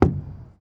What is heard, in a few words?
Park Playground Slide Perc; Moody; Houston